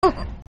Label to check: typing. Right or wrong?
Wrong